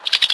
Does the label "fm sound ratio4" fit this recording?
No